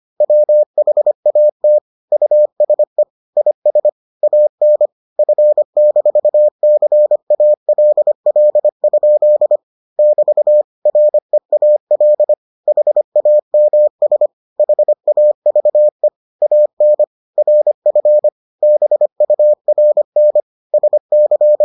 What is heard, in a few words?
morse